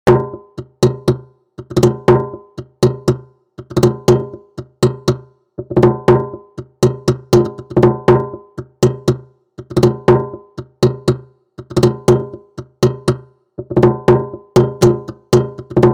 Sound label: doumbek solo with layer